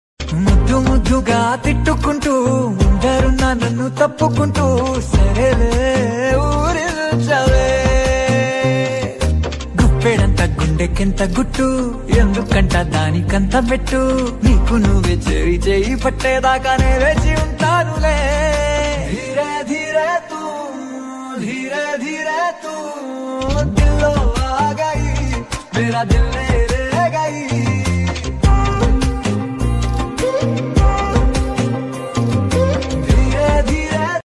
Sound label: Song Ringtone, Movie Ringtone; Funky Movie; Vishwak Sen; Kayadu Lohar; Telugu